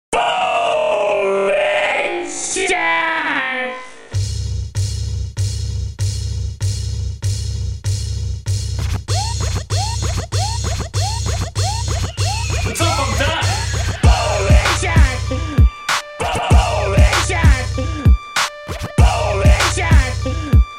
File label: Remix